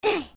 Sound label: Young Push; Link pushing a large object (ledge climb #1 sound in higher pitches)